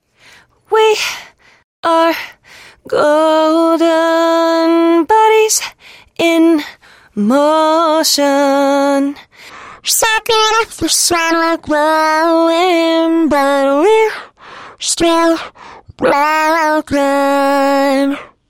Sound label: Vocal Bender AM; Waves